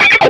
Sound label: desktop logout; jam; stereo